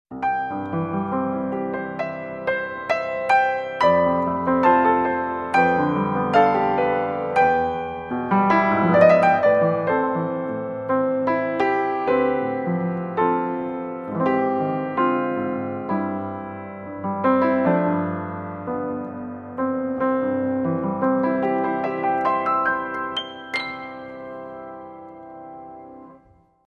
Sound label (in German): gestimmt